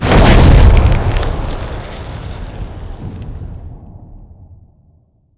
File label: shout frostbreath